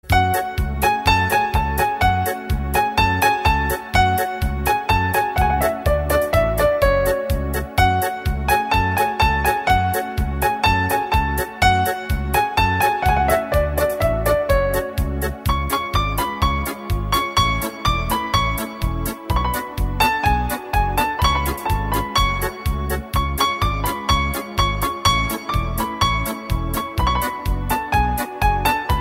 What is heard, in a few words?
Classic Romantic